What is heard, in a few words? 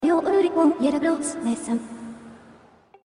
Appears